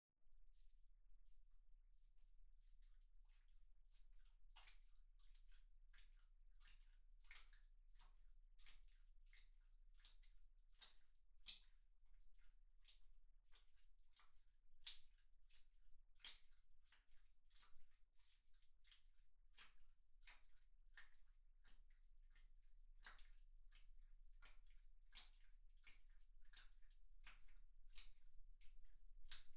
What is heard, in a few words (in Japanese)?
クチュ音 棒 低速; KU100; アダルトな音